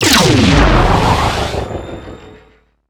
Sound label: Sentient Vessel Laser Attack; Warframe; Tau; Abilities